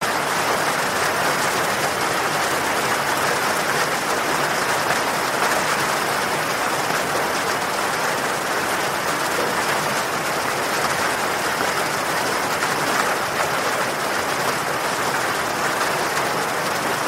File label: rain on tin roof; sleep